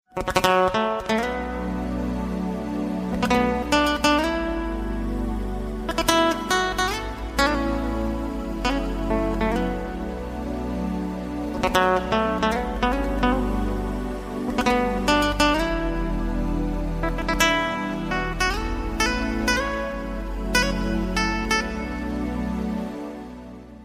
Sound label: sad guitar ringtone